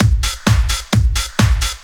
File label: straight, Techno Trance Essentials drum loops